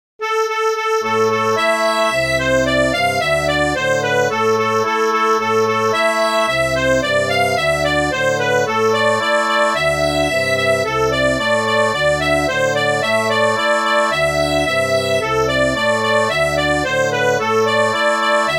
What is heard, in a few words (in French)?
Suite gavotte du Bas-Léon